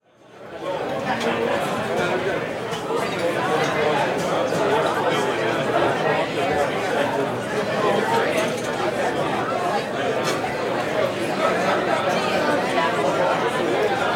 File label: Bar Pub Restaurant Busy Roadhouse, Walla; Sound Ideas; Walla Series